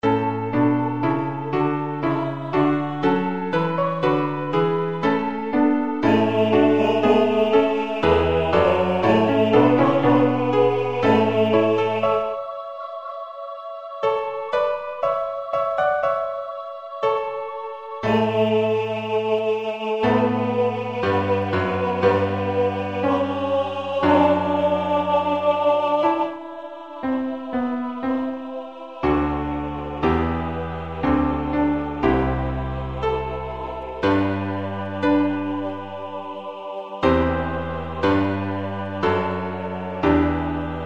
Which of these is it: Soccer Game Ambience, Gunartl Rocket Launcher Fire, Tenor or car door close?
Tenor